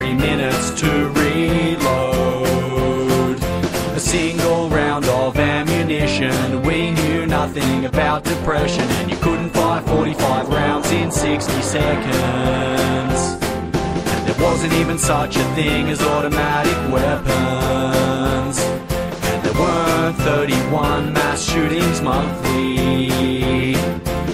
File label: australian ringtone